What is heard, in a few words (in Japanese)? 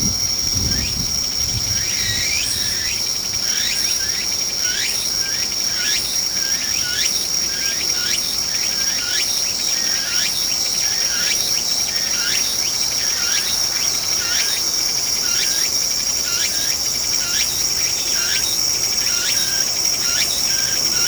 Musi No Koe